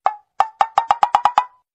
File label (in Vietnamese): tieng go mo